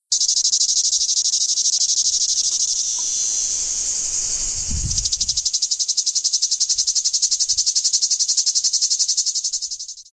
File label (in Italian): cicala; test riconoscimento versi animali